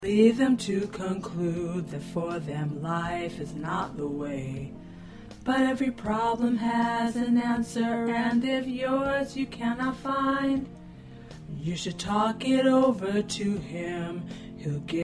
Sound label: Tenor, choir